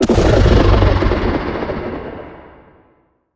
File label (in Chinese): 监守者音爆声